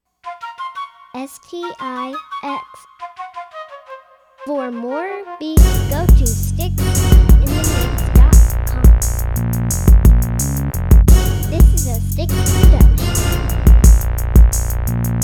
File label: flute and strings